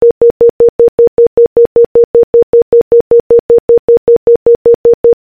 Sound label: Tone